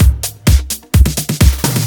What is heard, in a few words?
Fill Ins 128BPM; Vengeance Samples